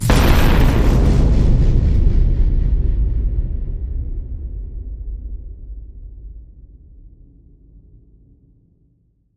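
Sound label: hit horror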